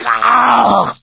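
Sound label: death scream, male; Left 4 Dead 2; coach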